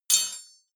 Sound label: metal impact bar rattle; industrie-wirtschaft